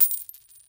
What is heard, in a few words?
Coin